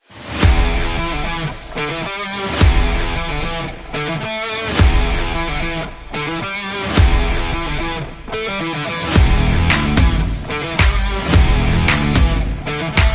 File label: Sport Rock Background Music